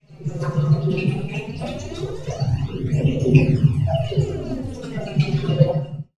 sound design big underwater flanger reverb bubbles sound design element